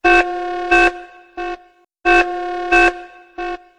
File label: scifi siren; sirens